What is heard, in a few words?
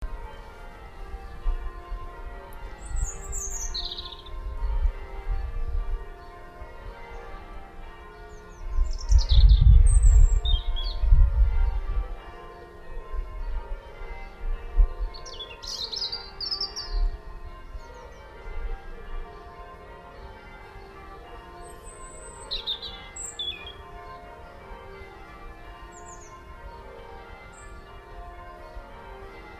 st hells bells and birdsong